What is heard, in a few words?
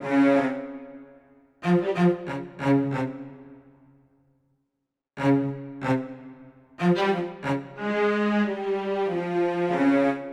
Low Strings, Hip Hop Exotica, 093 Amin; Big Fish Audio